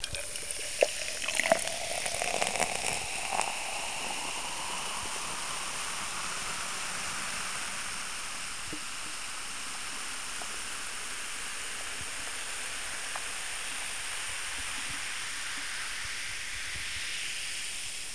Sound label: pour; Desktop Themes; Jolt Cola